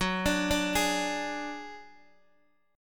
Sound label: F sharp Suspended 2nd Sharp 5th, Guitar, DADGAD